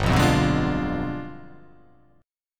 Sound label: G 7th Flat 9th